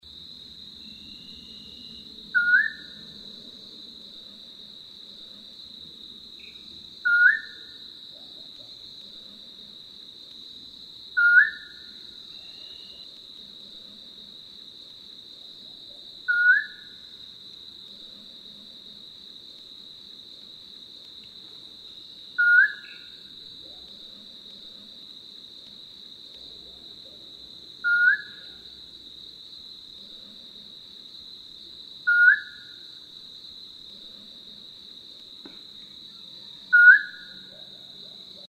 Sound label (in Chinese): 黃嘴角鴞; 鴟鴞科/留鳥